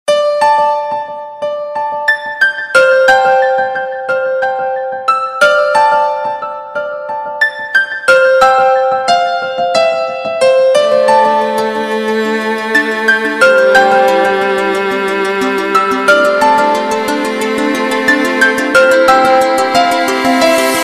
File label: Melody, RingTune